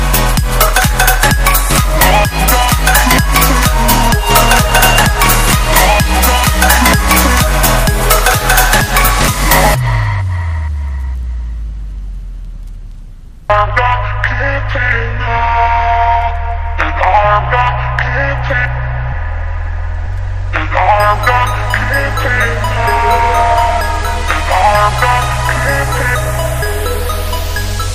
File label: mainstage; LOFI